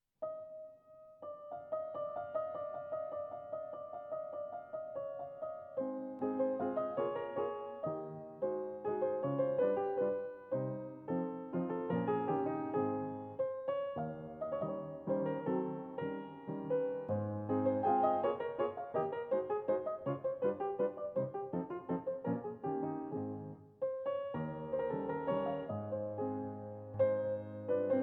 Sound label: Mazurka As-Dur